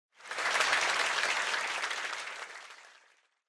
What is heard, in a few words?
Applause Crowd Short